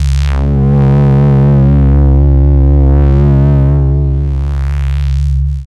Mud Bass, Drum n Bass, Bass Loops; KJ Sawka; Drum n Bass & Beyond

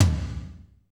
TOM, Roland Kits; Northstar - Drumscapes Roland

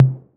TOMS 808

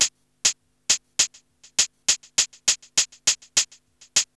HH LP, Hip Hop R n B; USB Soundscan vol.19; AKAI

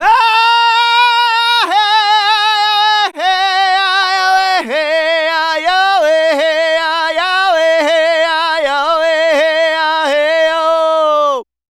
Native Vocals, Native American

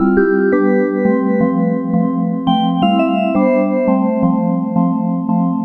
Chime Loop

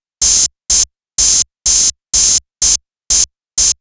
drum hat 125, Classic Chicago House Drum Loops

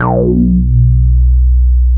B2, Bass; E-MU Producer Series Vol. 6 – Analogia Project (CD 1); Boom da Bass 2

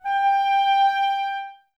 clarinets, Wood Winds

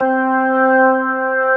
B3 C 3-3, ORGAN; AKAI S-Series CD-ROM Sound Library VOL-1

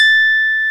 DIGITAL SYNTH; OMI - Universe of Sounds

